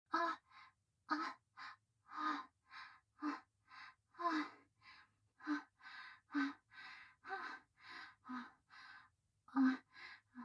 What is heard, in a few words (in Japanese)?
正面近い・喘ぎ声・弱; ミーシャ